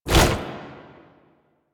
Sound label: Tank Infested Arm Move Fast; Enemies; Movement; Lasrian; Warframe